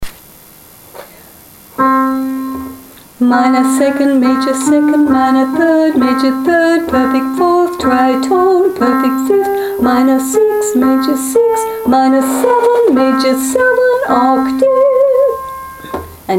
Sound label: Chromatic intervals (naming them ' C' & on 'ah' in C#), Singing Exercises